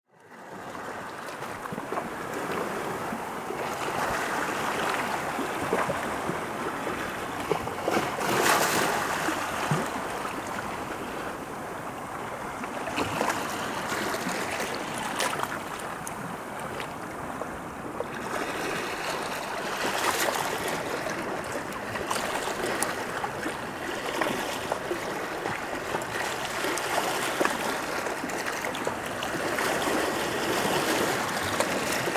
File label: nature sounds ocean waves for relaxation